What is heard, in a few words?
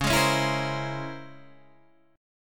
C sharp Minor Major 13th, guitar chord